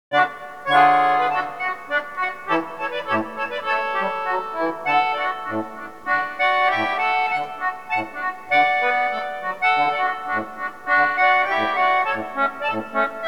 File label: concertina; concertina (or fiddle) and cello; Only got around to record the first few phrases; arranged by Jenny Cox in the ICA music supplement; English concertina